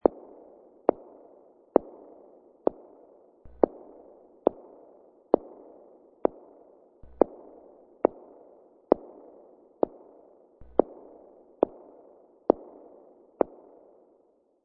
Footsteps Hallway, Slow walking of heels on hallway with much room reverb.